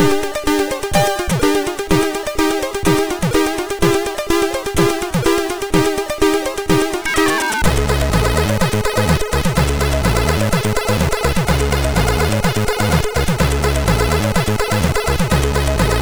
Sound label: loader, MOS6581R3; Yawn